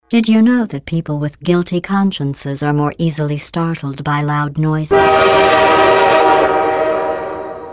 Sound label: train horn; Portal 2